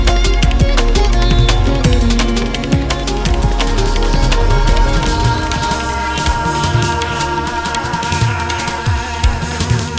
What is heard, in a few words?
linear crossfade